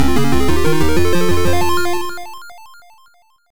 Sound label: Level Complete; Turrican 2; Atari ST